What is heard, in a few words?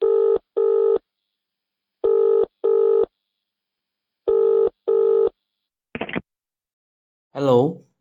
Dial tone and pick up